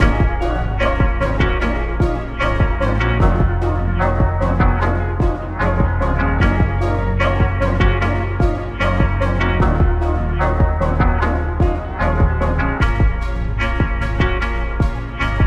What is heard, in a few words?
Calm The Storm